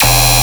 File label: Bass Hit E, Dubstep; Shine Bass; Ghosthack; AC2024 - Day 13 - Dubstep Sounds 2025